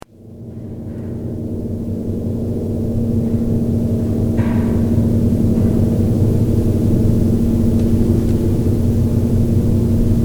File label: after piano long